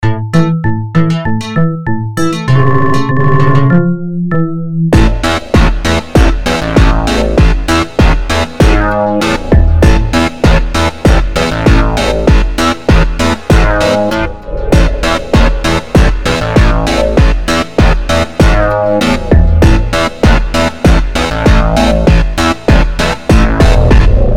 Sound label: Beat